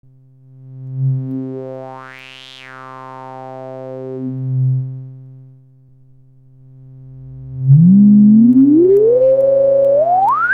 bpf reso, synthesizer

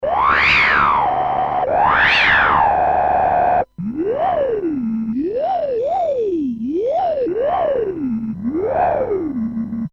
synth fm; mfb synthII

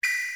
hh, vermona drm1

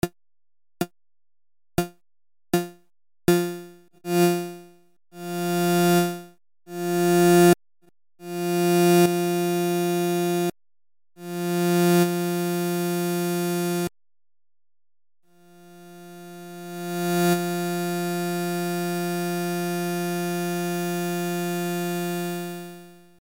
env amp, synthesizer basics

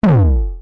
BD, vermona drm1

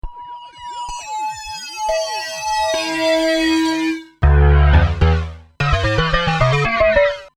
xmod vari2, jx8p; moogulator

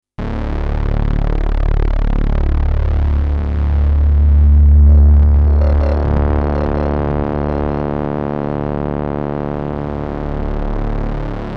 Vectortravel, Modular System; Auralesque

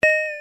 multi, vermona drm1

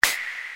SD, vermona drm1

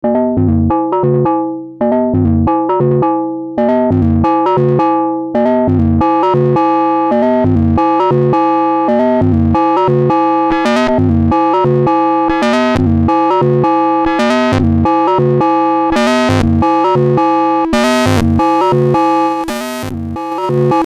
ring A bell, SH7; Roland SH-7 Synthesizer

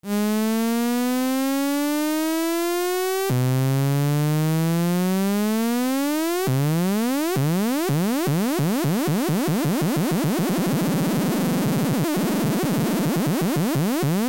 lfo pitch saw, synthesizer

